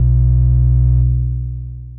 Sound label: bass